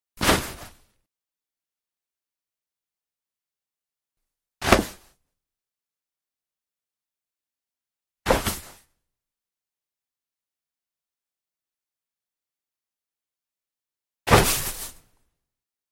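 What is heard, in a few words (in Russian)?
звуки сидящего в кресле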